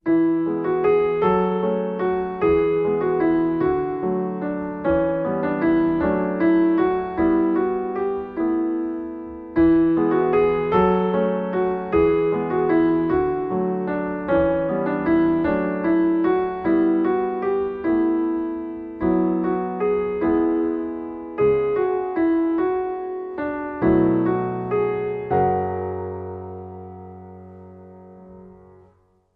Kind Waltz

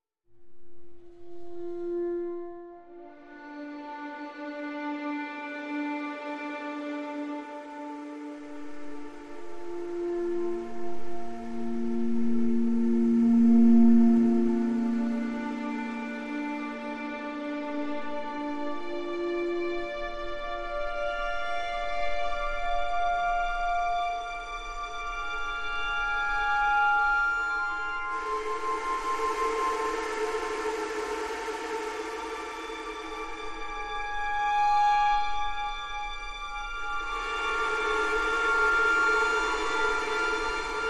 Rainy Strings

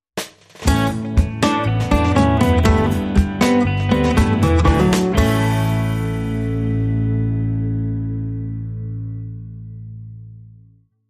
Acoustic Funky Theme

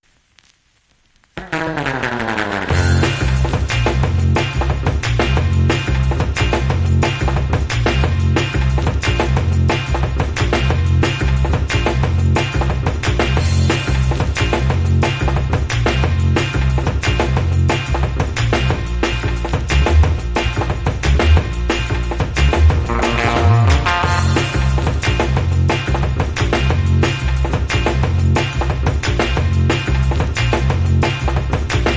Rockabilly Skank, Underscore

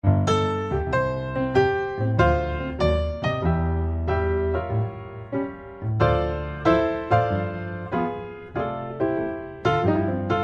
O Christmas Tree Upbeat; Trad (PD)

